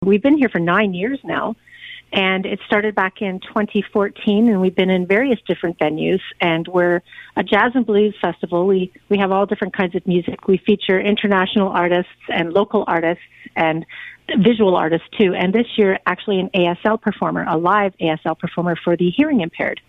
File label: south coast jazz